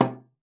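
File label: C3, bass flute; Xsample VOL-2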